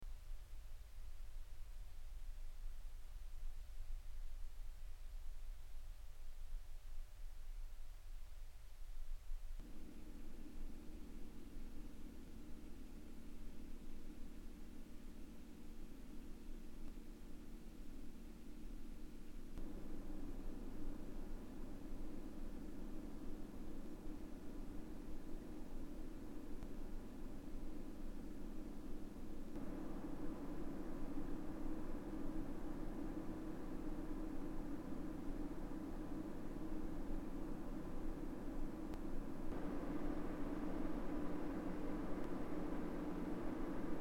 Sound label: silverstone ft03 baseline 5v 7v 9v 12v